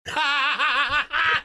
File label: Laughing